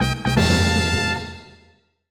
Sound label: levelup